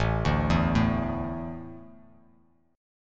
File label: A Suspended 4th; Asus4 arpeggiated; JGuitar